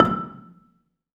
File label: BELA E5, BELAFON, Asian Traditions; USB Soundscan vol.60; AKAI